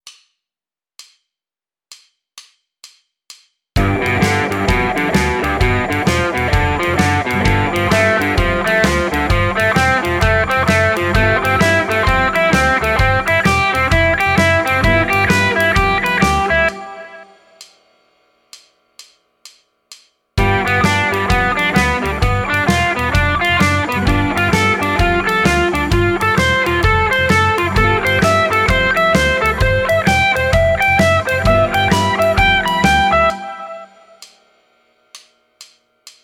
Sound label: Blues Arpeggios G13 Stacking; Guitar Techniques 2019 Issue 297 July